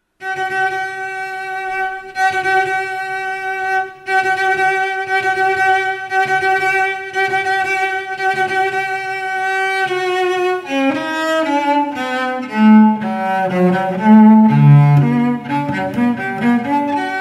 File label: Solo Cello; Bridal March